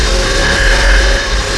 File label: whiteray loop, spells; wizardwars